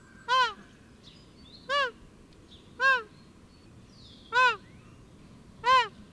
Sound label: Anseranas semipalmata